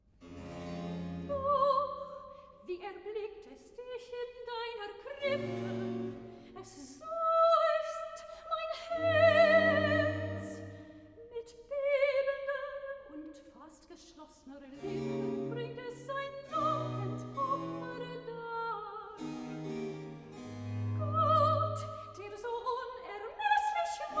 Recitative (soprano); vocal; BWV121; BACH

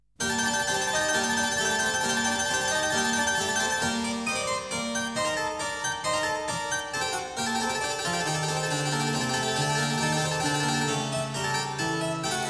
Prelude in G minor, clavier; Prelude & Fugue No.16; BWV 861; BACH; WELL TEMPERED CLAVIER; BOOK I

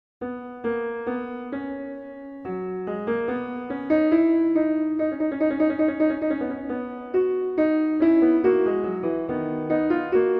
Fugue in B Major; BWV 868; Bach; Well-Tempered Clavier; WTC I

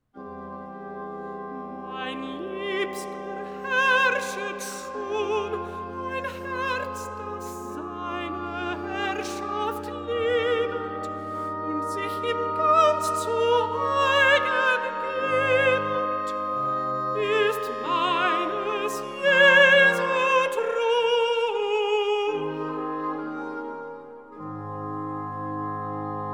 Recitativo (Alto); Mein Liebster herrschet schon; BACH; Christmas Oratorio

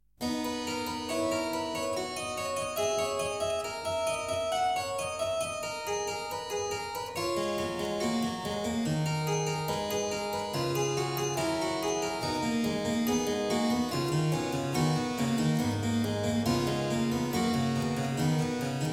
Prelude in B major, clavier; Prelude & Fugue No.23; BWV 868; BACH; WELL TEMPERED CLAVIER; BOOK I

